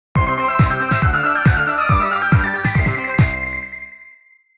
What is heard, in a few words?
STAGE CLEAR; Arcade Disc in Jaleco Variety